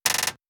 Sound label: gun reload; World of Tanks